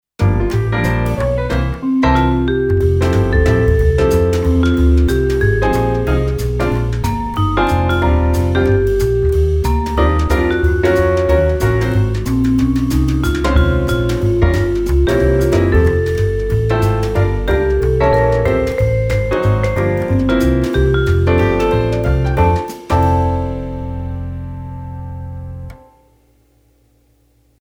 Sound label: vibes